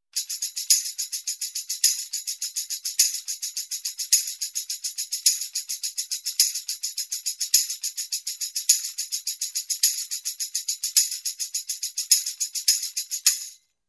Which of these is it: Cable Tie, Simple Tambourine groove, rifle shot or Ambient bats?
Simple Tambourine groove